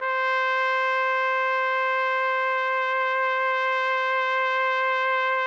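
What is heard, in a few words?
trumpet; Roland - LA Composer vol. 3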